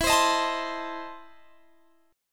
E Major 7th Suspended 4th Sharp 5th E, Guitar, Drop D; chord shape